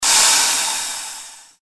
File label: water splash